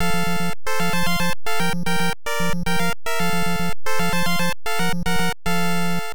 Start BGM; super xevious gamp no nazo; family computer; arcade

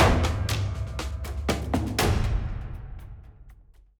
Transition; Skyway

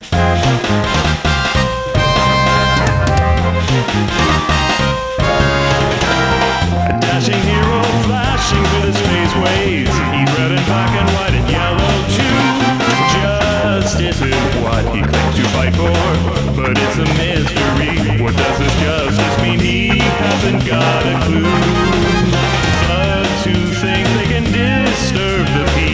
English; Chibi-Robo! Zip Lash; Go, Space Hunter, Go

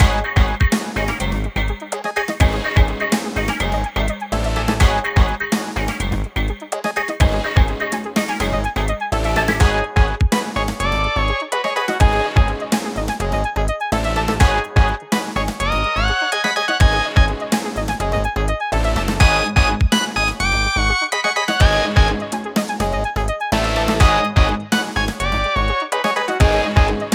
125 bpm

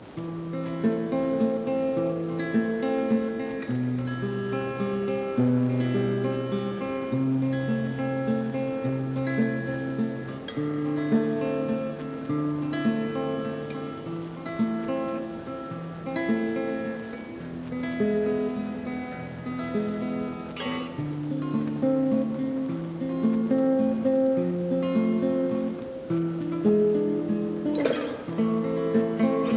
Radio Classical; Ether One Redux

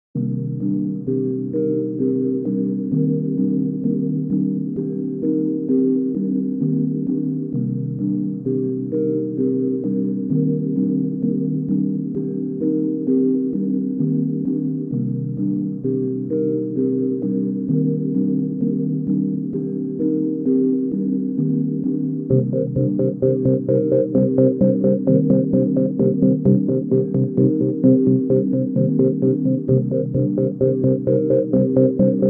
Emotional Thinking; After the End The Harvest

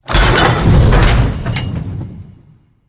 Soldier Catapult; the great ottomans strategy battle for throne android

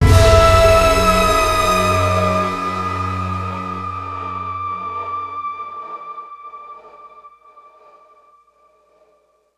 Sting; Stronghold

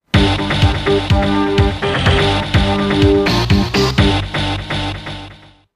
Endlevel; Jazz Jackrabbit 1 2; humble retro bundle

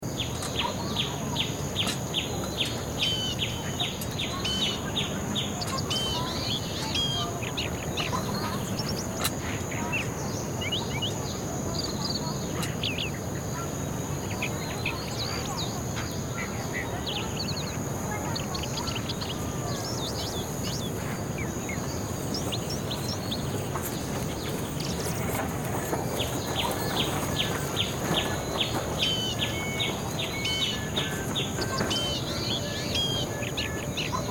Ambient; Flippin Kaktus

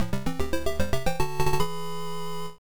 Level Start; Relevos Snowboarding; MSX; On Your Marks

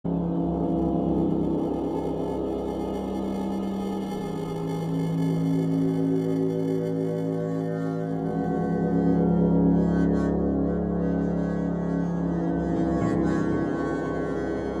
Fear (AMBIENT); F.E.A.R. Perseus Mandate

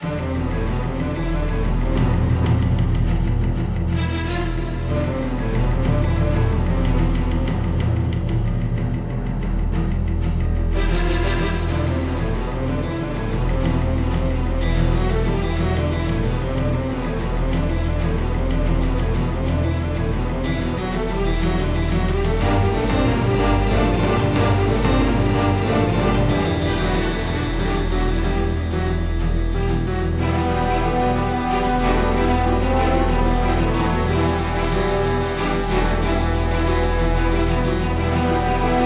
Battle Music; Platoon

